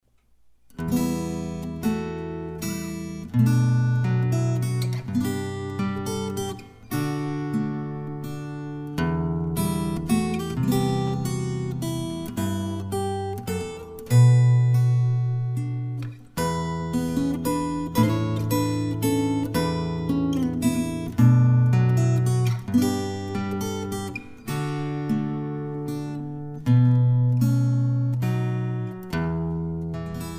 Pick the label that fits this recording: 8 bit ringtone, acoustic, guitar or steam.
guitar